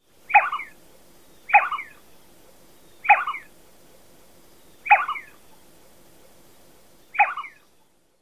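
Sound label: Lesser Scaup, male call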